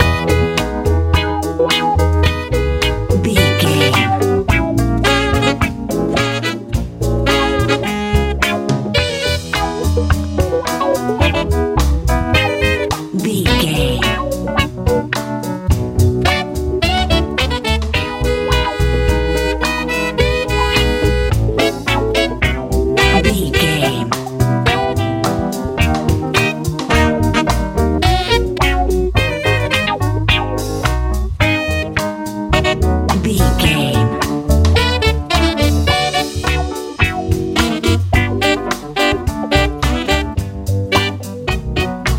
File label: bright nice wind sprint dreams funk